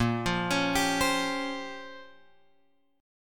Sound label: A sharp Minor 6th Add 9th A sharp, Guitar chord; A#m6add9 Chord {6 8 X 6 8 8} arpeggiated